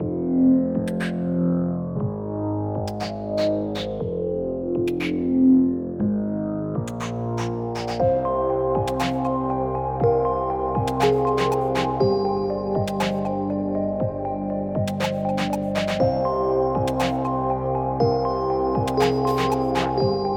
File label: jail Loop